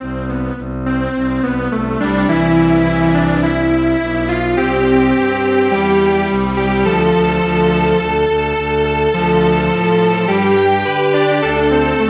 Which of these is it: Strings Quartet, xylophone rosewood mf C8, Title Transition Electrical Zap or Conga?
Strings Quartet